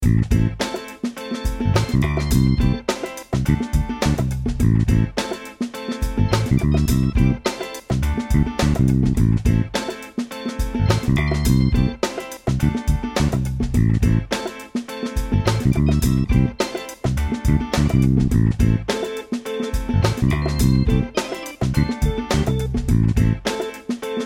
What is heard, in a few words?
Funk game loop